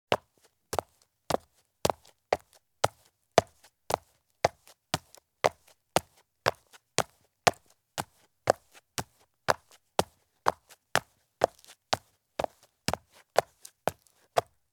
Women's Flat Shoes with Low Heel Take Medium Steps Down Concrete Stairs; Sound Ideas; Footstep Sound Effects